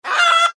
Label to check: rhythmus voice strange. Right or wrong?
Wrong